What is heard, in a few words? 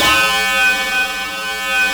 C5, SynthLine; NiceBeats